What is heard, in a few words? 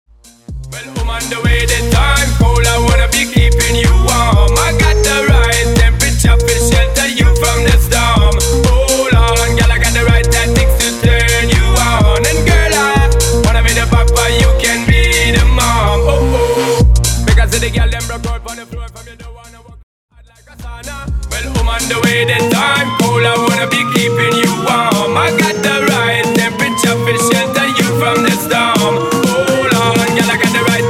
Hybrid House Mix